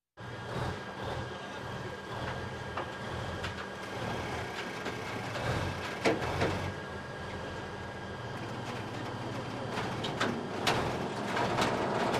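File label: Unfolding a pontoon bridge sound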